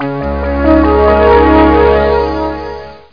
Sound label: synth